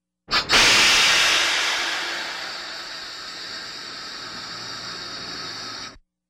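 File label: steam cutoff of a steam locomotive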